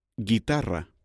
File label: musical instruments